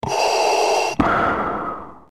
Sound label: Darth Breathe